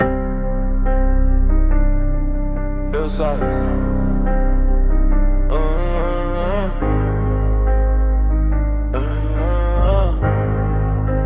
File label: memphis trap drill hip hop